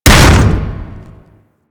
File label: Hammer Impact Default C; Warframe; Weapons